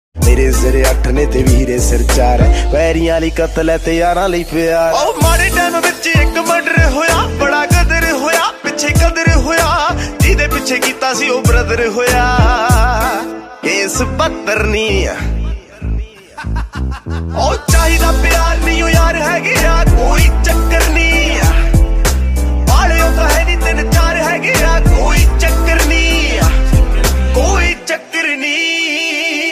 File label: punjabi song ringtone